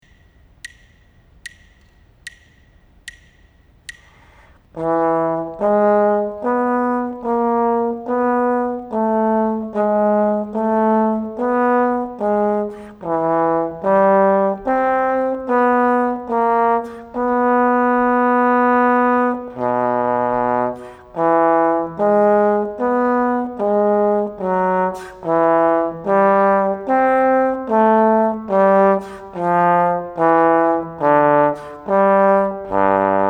melodic dictation, partially completed melodic dictation; ear training